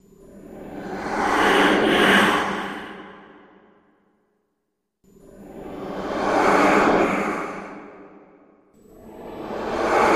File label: Dracula Evil Flying, ghosts & demons